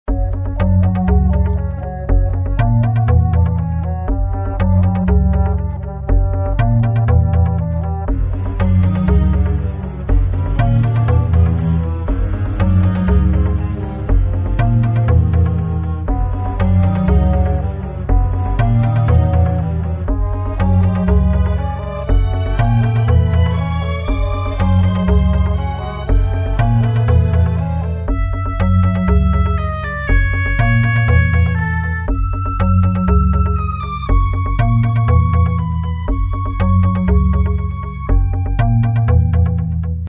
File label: amb walkin basso; Hyperspace Delivery Boy